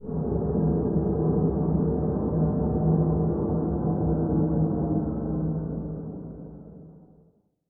black hole planet; Stellaris; machineage; planets

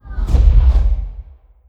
bombardment explosion; weapons; Stellaris

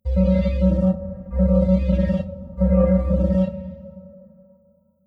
yellow alert; Stellaris

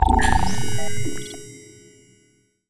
notification resolution defeated; Stellaris; federations

